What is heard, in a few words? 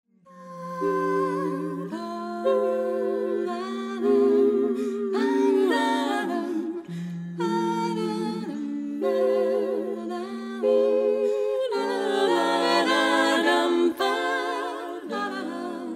blues; blues pro